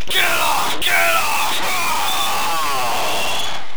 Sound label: Fire Scream; usmc